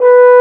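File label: French Horn mf B1; Digital Sound Factory Virtuoso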